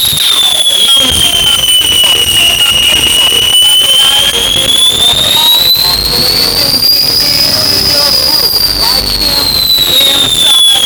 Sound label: flanger